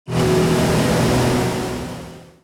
magic wand

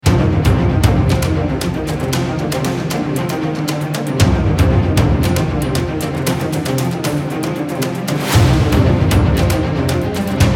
Battle BPM116 Cm

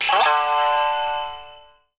japanese traditional

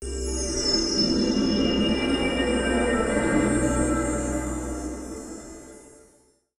magical stone

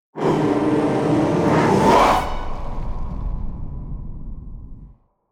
Jingle Horror; BPM90, 4/4, F#m, Voice + Strings; Horror 1

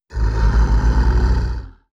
monster

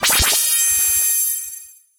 start

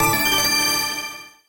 get item

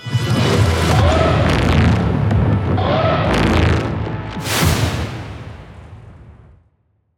Trailer Jingle BPM130

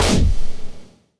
goblin hit

